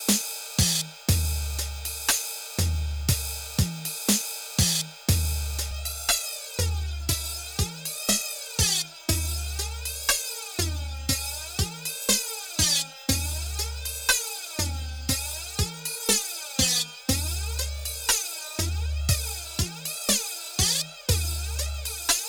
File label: NTS-3 Drum Flanger